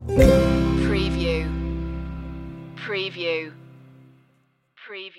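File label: stinger, Acoustic Country / Folk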